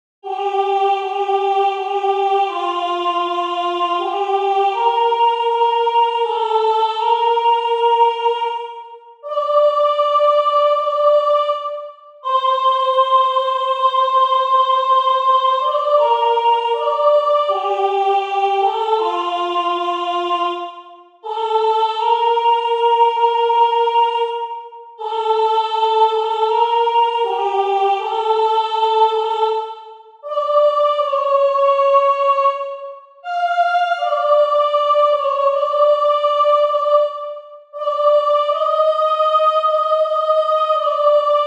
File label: Soprano; Henry Purcell; Funeral music of queen Marie